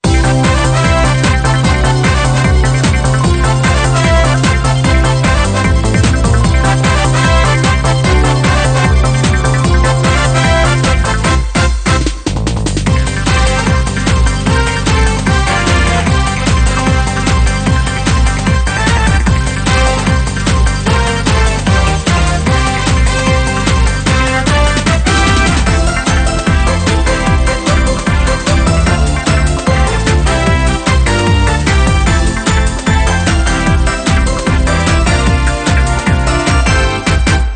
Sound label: Music Game General event